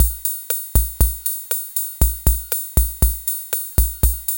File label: POPS, KR55; INIS - Funky Xtreams; KR55 LP4